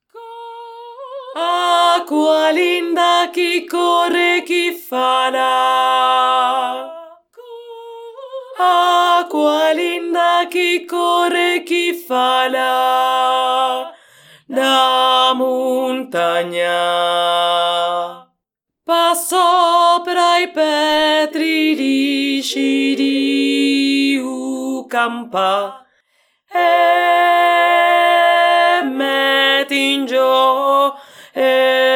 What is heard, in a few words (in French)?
voix grave; ACQUALINDA